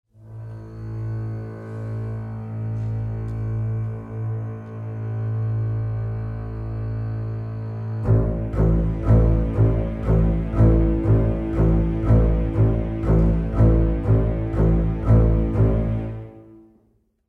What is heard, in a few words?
action low stacc strings